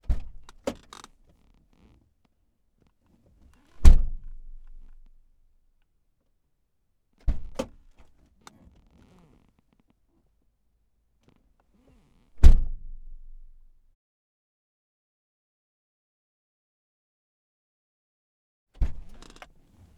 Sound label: Ford Probe Doors Various Interior; 1995; Pole Position; AMBEO